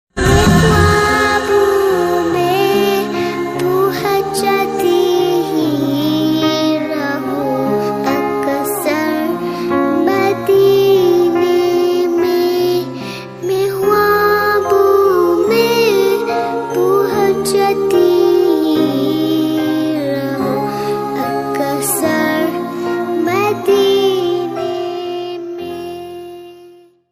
Islamic naat ringtone

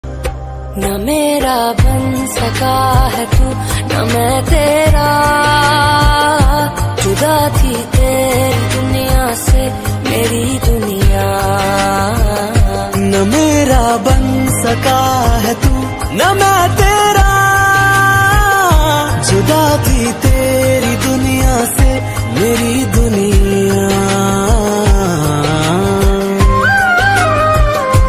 OST pakistani ringtone; Samjhota

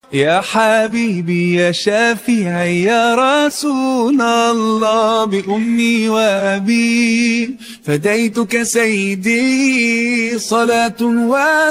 slowed arabic ringtone

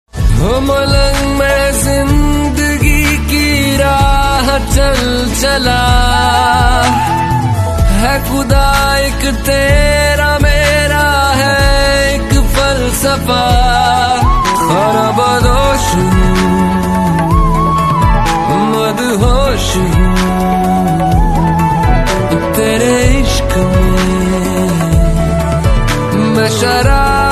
islamic ringtone

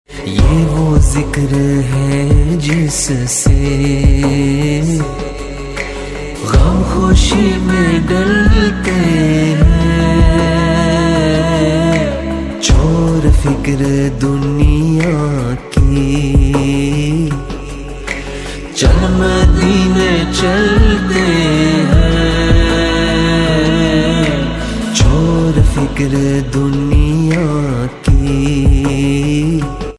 Medley islamic ringtone

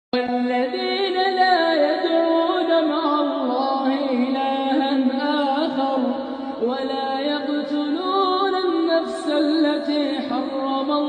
Quran Recitation arabic ringtone

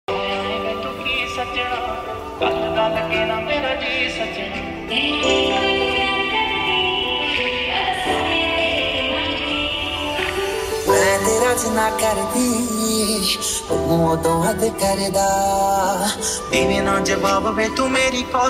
Punjabi mix mashup, panjabi ringtone

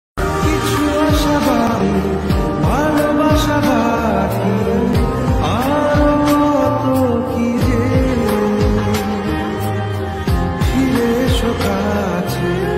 lofi bengali ringtone

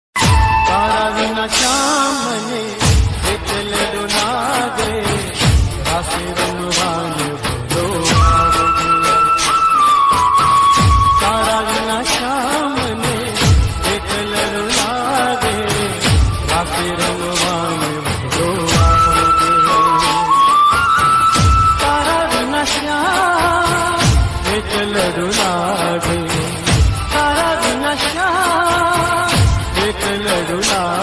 gujarati ringtone

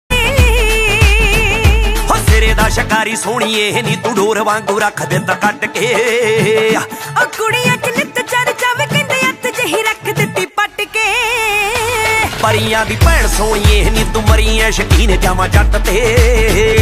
punjabi ringtone

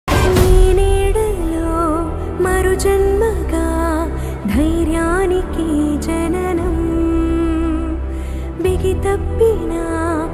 female telugu ringtone

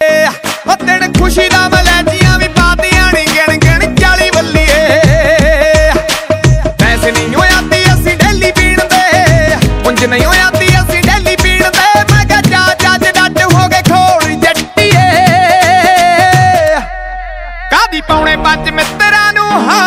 punjabi ringtone

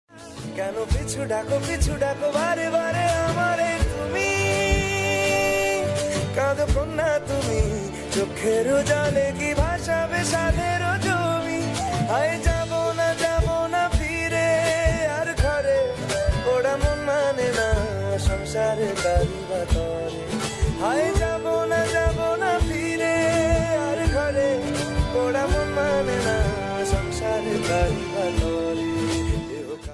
bengali ringtone

